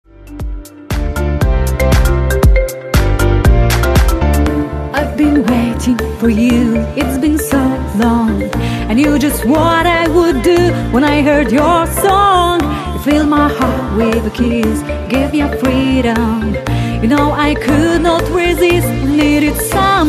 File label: Jazz